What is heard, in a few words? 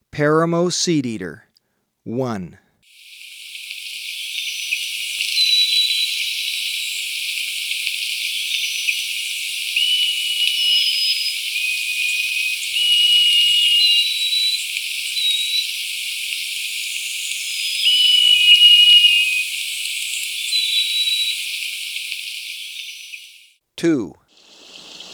Paramo Seedeater, Birds of Ecuador; S. America